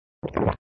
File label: Sound Swallow (prior to burp effect), flipper